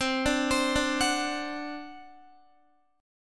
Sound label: C Suspended 2nd Flat 5th C down Guitar; Csus2b5 arpeggiated